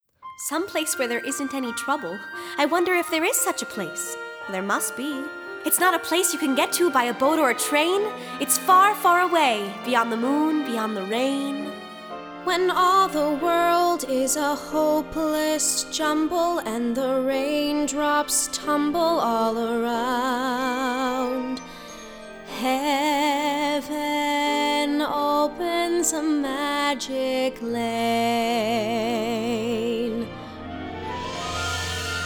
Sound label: Complete Tracks with Guide Vocals